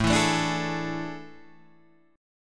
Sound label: A Major 7th Suspended 4th, Guitar, Open G